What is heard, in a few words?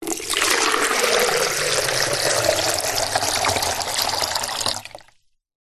foley liquid water pour into empty plastic container